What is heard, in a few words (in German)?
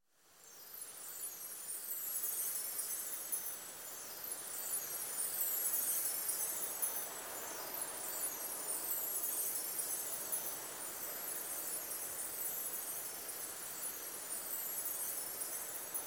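Engelgeräusch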